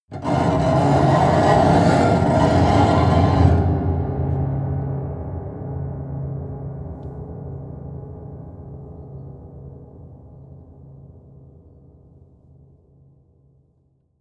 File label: piano bow string broken low slow